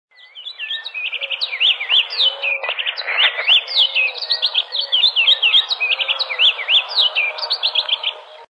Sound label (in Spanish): voz arañero cara negra